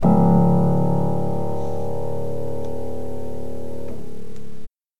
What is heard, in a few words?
Piano